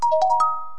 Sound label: Ding Sing Ding